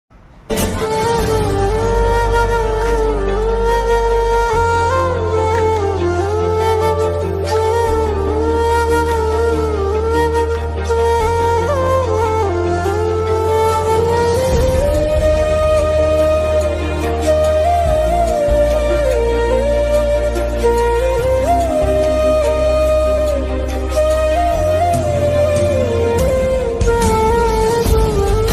ringtone south bgm ringtone tamil ringtone telugu ringtone amazing flute ringtone